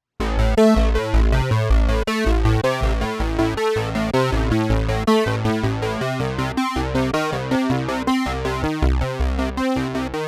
Short Tight Bass

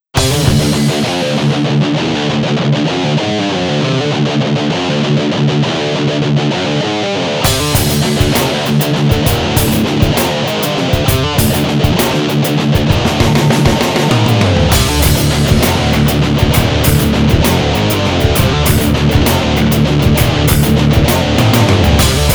patch demo

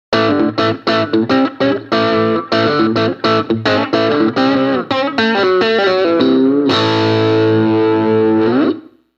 overdrive; cube 15

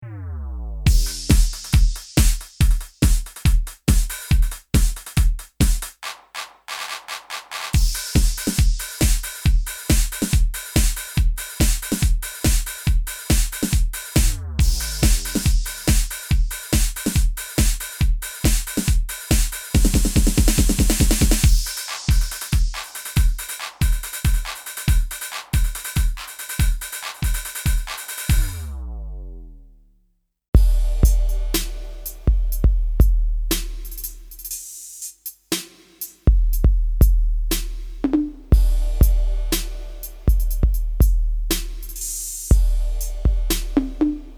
electro

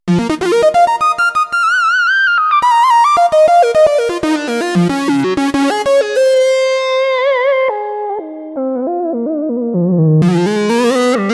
v synth vsynth think lead

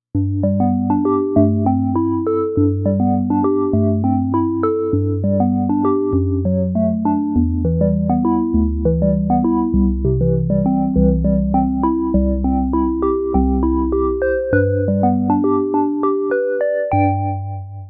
Vibraphone

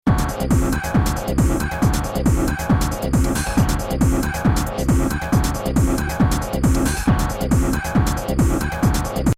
minimal

